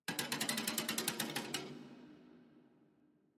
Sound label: ambience room torture cage